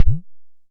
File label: Perc Bend Up, Tama TS-206